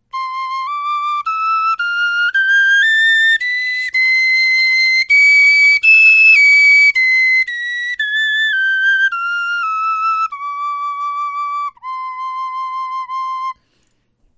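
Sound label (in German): tonleiter, Knochenflöten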